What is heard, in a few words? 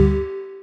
Perc Metallic Bell Like Hit